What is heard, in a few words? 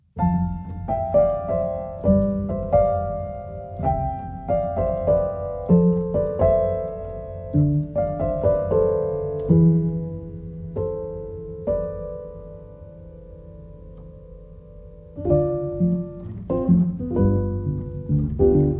Version piano